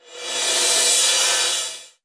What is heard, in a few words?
Sweeps Medium